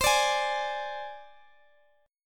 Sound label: C Suspended 2nd Sharp 5th, down, Guitar, Open G